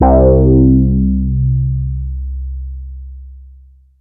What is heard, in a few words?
MOOG FALL; OLD SYNTHS; MINIMOOG; Rubber Chicken Software Sample Bank